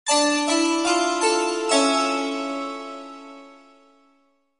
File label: lose; room